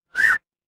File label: cartoon slide whistle